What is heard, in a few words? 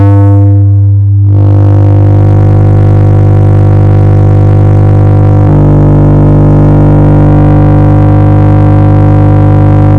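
futuristic sci fi in 2096, pulse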